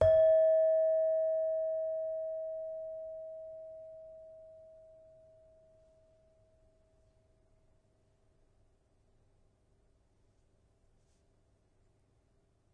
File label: Saron, Indonesian; Multisampled; WORLD